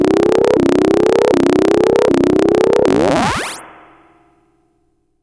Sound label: FX, EMS VCS3; Polyend; Simulacrum Sounds; 1 Shots; Synths